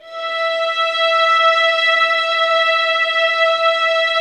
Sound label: violin E5, soft violins; Q Up Arts; Denny Jaeger Master Violin Library